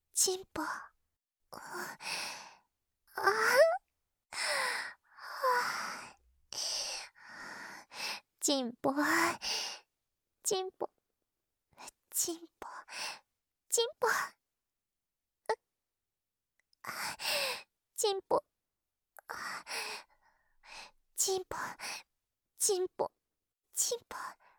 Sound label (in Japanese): 喘ぎ, ASMR; 【感謝価格】お屋敷メイド絆の「ちんぽ♪」っていっぱい言うおまんこご奉仕♪♪; オナサポ